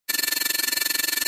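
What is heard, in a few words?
Computer Read Out High Pitch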